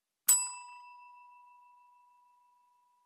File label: reception bell